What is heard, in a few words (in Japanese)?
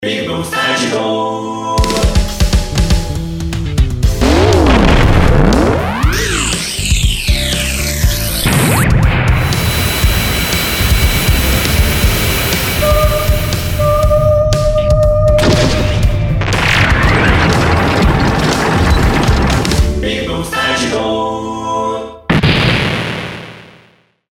白い リアル ロボット; ぴぐみょん効果音素材集005白いリアルロボット02サンプル; ぴぐみょんスタジオ作品紹介(効果音素材集)